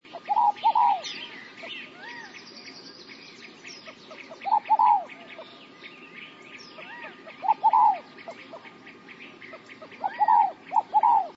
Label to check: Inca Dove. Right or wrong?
Right